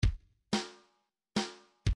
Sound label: swing kick snare 1 bar